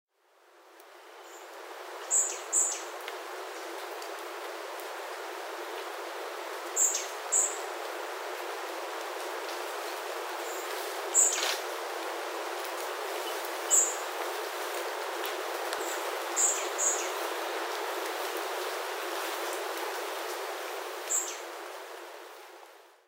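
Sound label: Owston's varied tit, ishigaki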